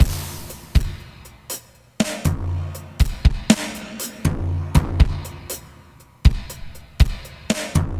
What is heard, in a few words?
Acoustic Flow, Processed Percussion